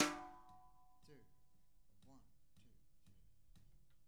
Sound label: jazz brush loops